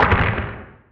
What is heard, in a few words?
asteroid damage interstellar small; Factorio